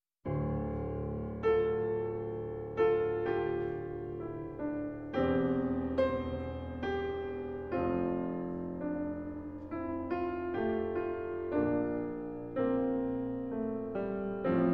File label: Preludes and Fugues For Piano, Prelude and Fugue No. 24 in D Minor